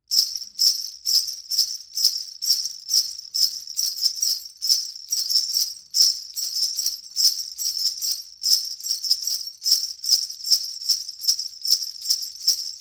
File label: Bells row pair braided on rope, Bells Percussion Instruments; Afroton